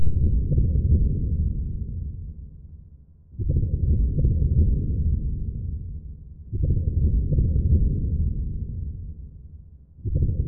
aquatics dragon idle

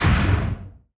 distance plasma fire, weapons; Stellaris

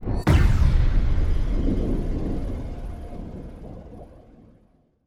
pulsar planet; Stellaris; machineage; planets

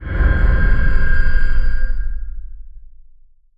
distance titan laser fire; weapons; Stellaris

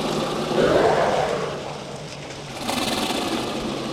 swarm queen idle; Stellaris; other entities

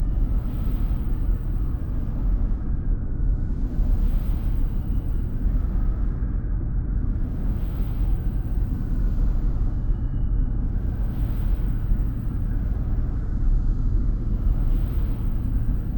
amb starbase mercenary; Stellaris; overlord; Starbases

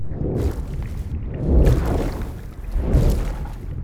aquatics dragon move

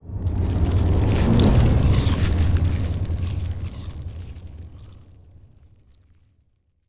ant ship colossus move; biogenesis

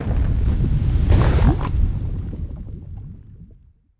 molten planet; ambient; Stellaris

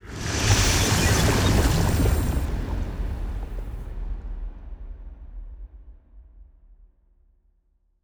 ship science ship move; Stellaris; toxoids

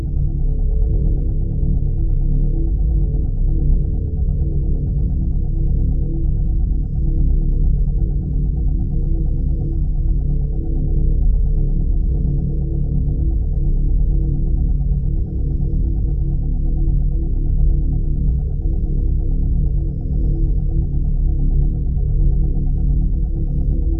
amb gatebuilder cruiser; Stellaris; distant stars

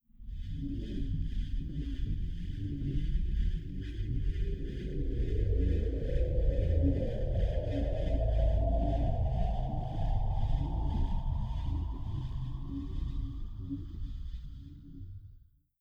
falling star; ambient; Stellaris

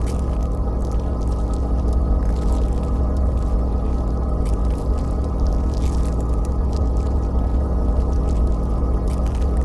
pro ship stinger idle; biogenesis

